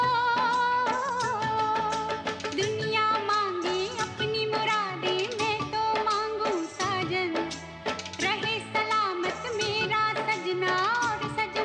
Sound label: Ringtone